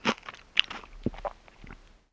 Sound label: EAT APPLE END